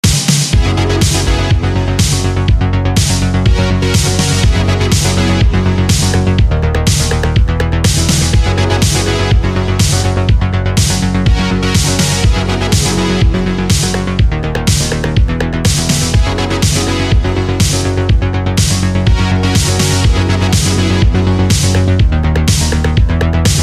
retro wave 80s

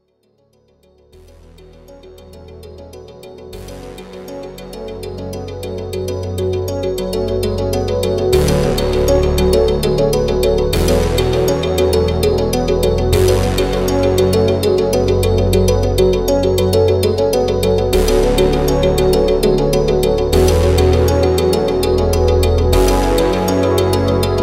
Theme 80s excitement builder

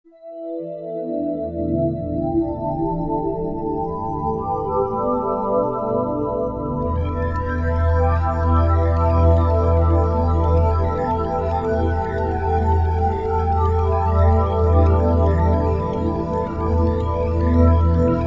Ambient Wonder; Halo Combat Evolved; Halo Worlds

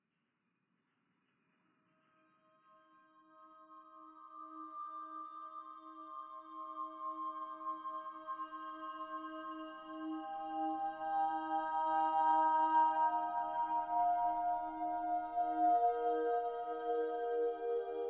Windchime; snowy night; Playgrounds

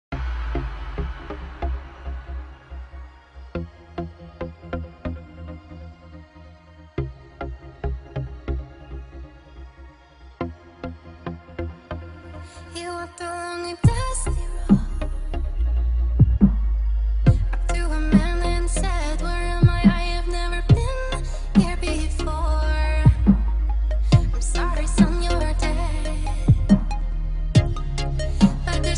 trance beat sound fx female girl voice vocals

